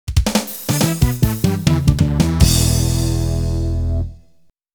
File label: Flat Tire (Game Over)